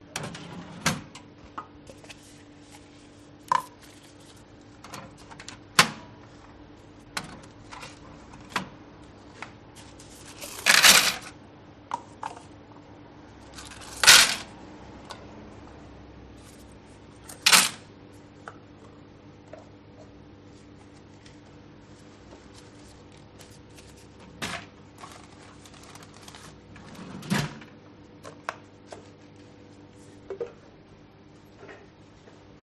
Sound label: cash register fill money handling